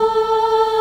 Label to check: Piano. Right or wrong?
Wrong